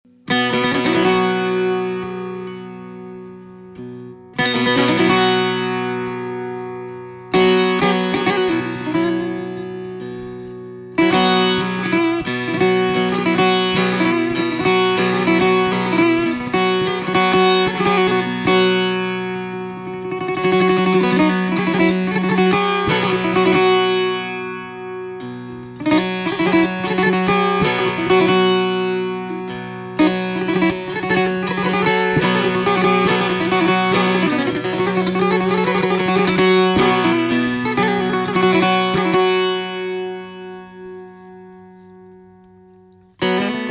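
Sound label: Taknavaziye Setar, setar solo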